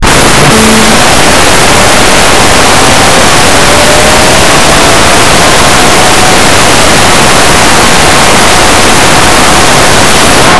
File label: comput